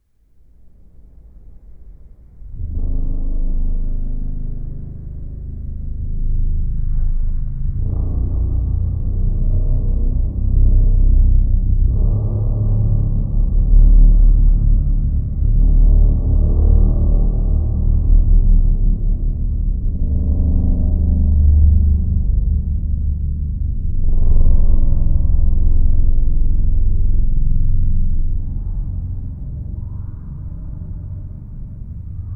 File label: low long background rumble